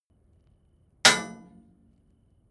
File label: metal impact bright short hard